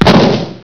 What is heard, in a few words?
SHOT